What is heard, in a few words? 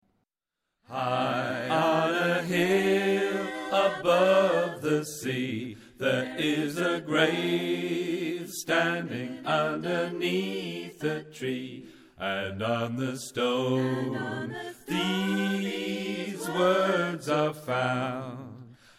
bass